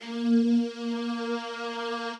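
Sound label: slow flange strings bb4; fx; PC Gamer IT CD 40; SMACDEMO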